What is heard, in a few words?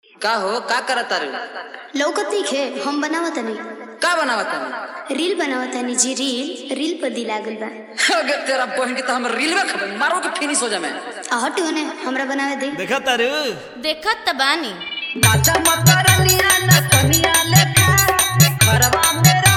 Bhojpuri Dj Remix Songs